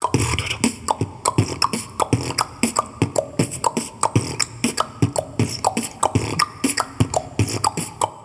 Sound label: beatbox; 16beats